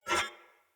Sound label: Short Sword Whoosh Accent; Weapons; Swords; Sollos